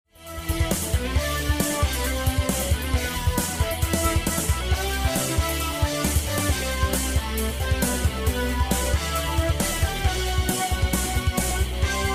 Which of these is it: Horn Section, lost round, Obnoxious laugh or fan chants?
lost round